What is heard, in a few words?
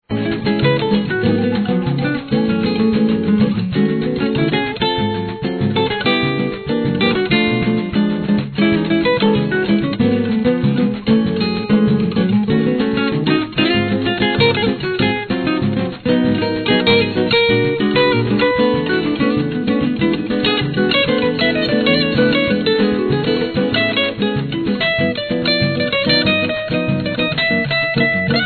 Samba